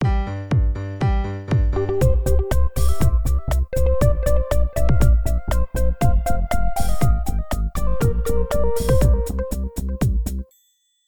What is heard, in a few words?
Jingle